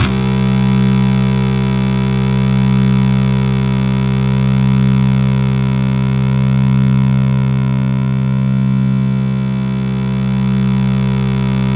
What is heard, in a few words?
C2, Synth Line; 90 s Sample CDs; NiceBeats; SynthLine VOL-33